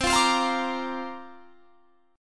C 7th Suspended 2nd Suspended 4th C down; C7sus2sus4 strummed

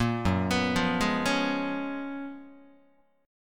Gb Major 11th Gb, Guitar; GbM11 arpeggiated; JGuitar

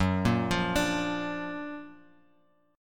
Gb Augmented Major 7th Gb down Bass Standard; Gb+M7 arpeggiated

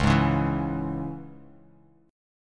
C sharp Minor C sharp, chord; strummed; 9,11,11,9; JGuitar

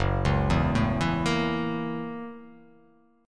F sharp 7th Sharp 9th

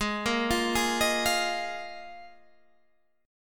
D sharp Suspended 2nd Suspended 4th G sharp

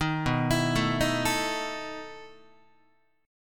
B 11th Guitar; arpeggiated; 6,7,7,7,7,8; JGuitar

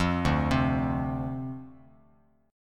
D-Minor 7th, D, Bass Standard; x,8,0,5; Dm7 arpeggiated

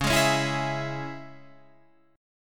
C sharp Minor, Guitar, Half Step Down; chord

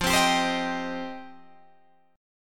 F 7th Suspended 2nd F Guitar Open D

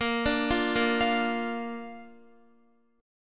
Bb Major Bb down Guitar; arpeggiated

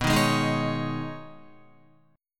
B 6th Add 9th B Guitar, chord; strummed

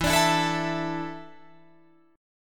E 7th Suspended 4th, Guitar, Drop D

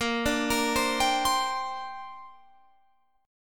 A sharp 6th Add 9th, Guitar Standard; A#6add9 arpeggiated; JGuitar

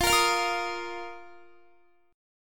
F 7th Suspended 4th, Guitar; F7sus4; 4E,4A,5D,5G,5C,6F; strummed

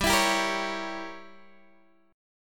G Diminished 7th G down Guitar Open D

